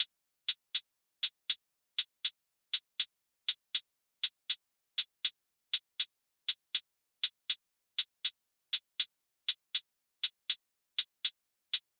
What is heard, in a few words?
Drum Loop 80 BPM Hihat, Lofi; Loop Stems; Cymatics; GHOST (Beta Pack); Autumn Drum Loop